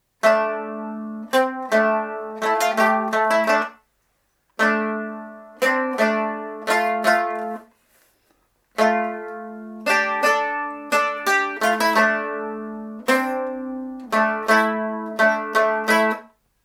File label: gittern drone; early music